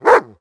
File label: dog; World of Tanks Blitz; hangar 9 may